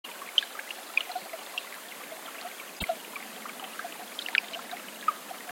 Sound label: Redwood Forest; Field and Foley